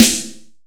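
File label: PWR snare, GM drum set; AKAI S-Series CD-ROM Sound Library VOL-8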